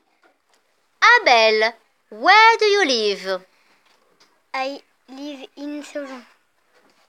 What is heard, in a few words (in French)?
vocal